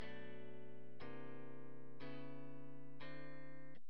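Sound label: wet guitar chords, Hybrid neural guitar overdrive; Morphdrive; Demos for hybrid configurations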